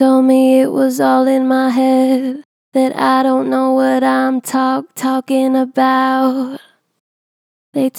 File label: Natural Vibrato Gentle, Vocals; Told me it was all in my head; Harmor Vocals